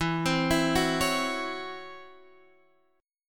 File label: Db Minor Major 7th Double Flat 5th